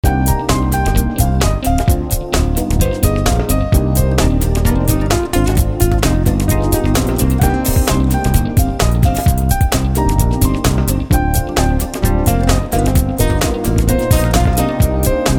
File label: Sweet groove